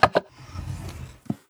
menu open

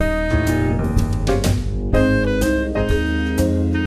serving music; Sesame Street Ready Set Grover

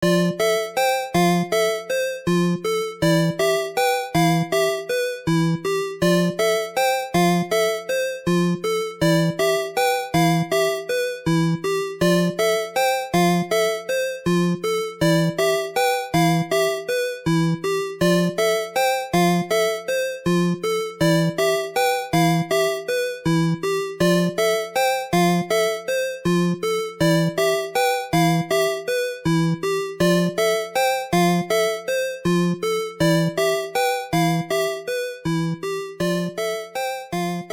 Cleared 8-Bit; Den of Trials

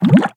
Object Exit Water

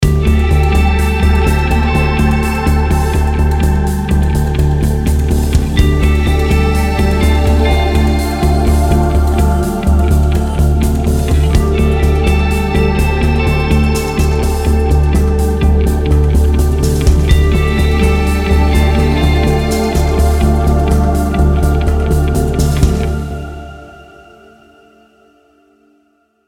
Guitartheme; Terra Invicta

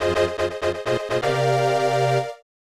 Race Start; Final Stretch; 1993 snes

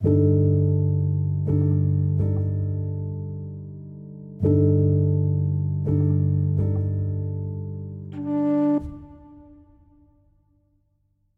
82bpm 3 4 time; chicory a colorful tale; macos ps4 ps5 switch windows gamerip 2021

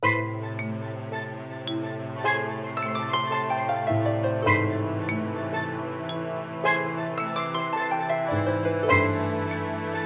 Dark Harp

